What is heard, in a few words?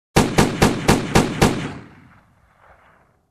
Fast multiple pistol shots